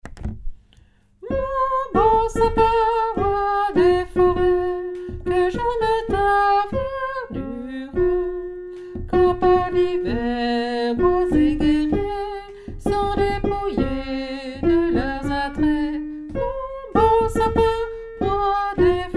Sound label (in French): mon beau sapin basse; nouveaux noels; chants noel